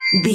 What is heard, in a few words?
ui menu button beep